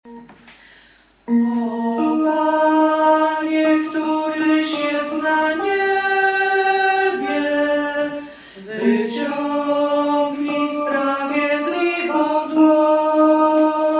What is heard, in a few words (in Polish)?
Modlitwa obozowa